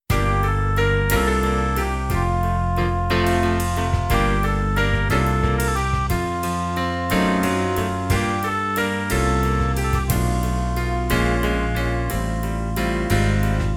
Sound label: Instrumental